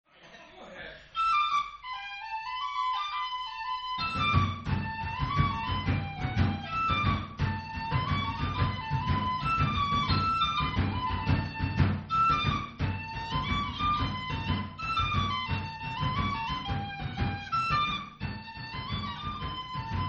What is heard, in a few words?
Hornpipe whistle and tap; the Bugle Hornpipe